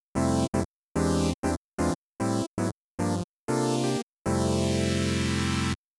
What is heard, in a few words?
filter; Wiwi Digital music technology